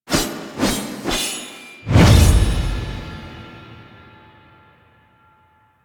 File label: Sword Swing; Warframe; The New War; Teshin Intro